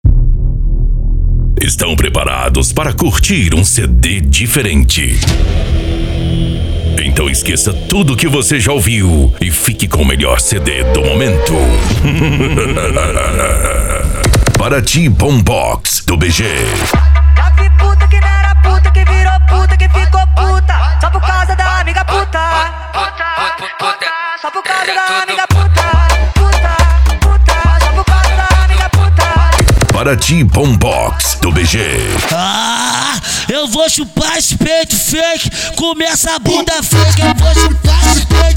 bombox funk

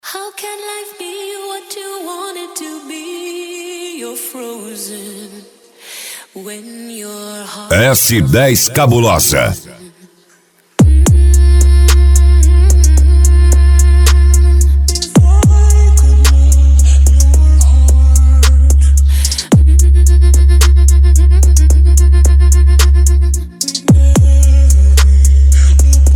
funk comercial

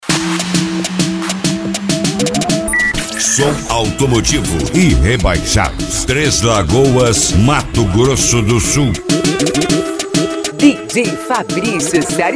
som automotivo; rebaixados

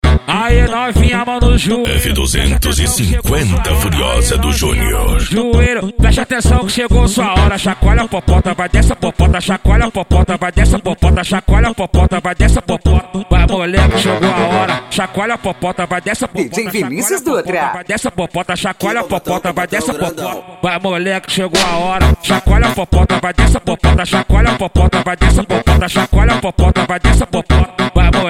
funk e sertanejo

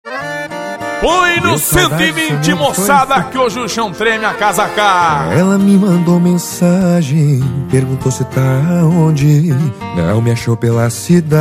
sertanejo com locutores de rodeio

